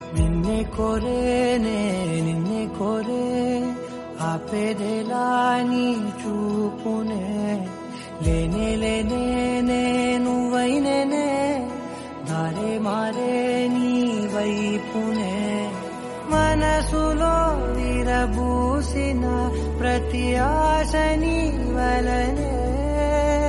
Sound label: major instrumental love